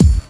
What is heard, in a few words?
BD, Dance Mega Drums, HIP